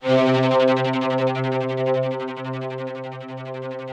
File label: ROUGH STRNGS, Yamaha VP-1; Music Store